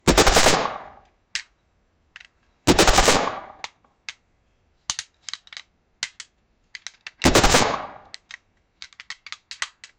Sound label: bullets spited out the ba, automatic fire